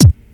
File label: dry kickdrum f key; Duration 0:01; Channels Mono; kick drum samples